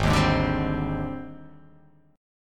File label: Ab-Major Sharp 11th, AbM#11 chord; strummed; JGuitar